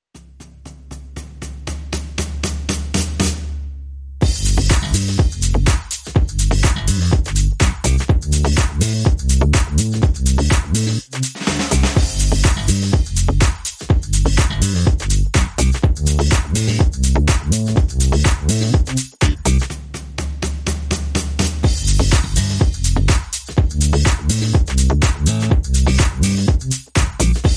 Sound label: Drums and Bass Only